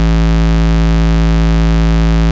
Bass Root A

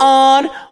Vocal Oneshots; Vengeance Samples; Vocals

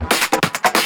Loops Fills; Vengeance Samples

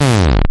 Slides; Vengeance Samples; VEH1 FX

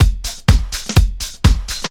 drum loop 125, vocal house; PS-28 Vocal House Samples; drumloops; houseworx

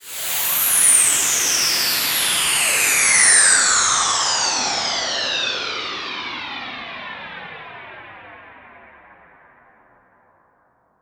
Downlifter

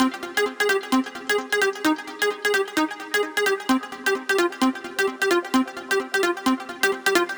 Root F 130 BPM; Vengeance Samples; VEH3 Special Sounds

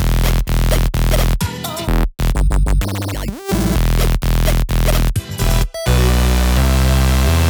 128BPM Synths; Vengeance Samples; Knight Kit

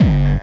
BD Distortion, Bassdrums; Vengeance Samples

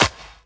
Claps

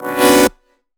Synth Shot; Vengeance Samples

